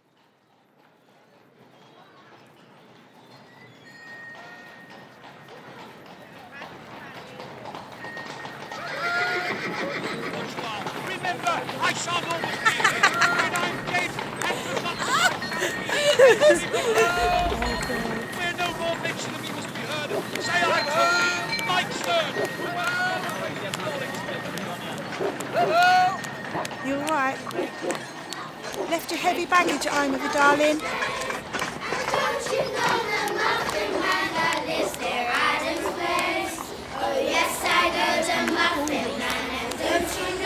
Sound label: Soundscape